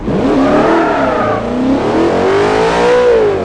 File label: first, vehicles; audir8